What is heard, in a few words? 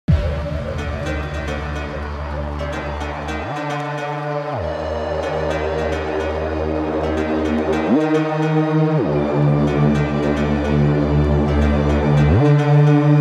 Bassline; Aquaman vs Ocean Master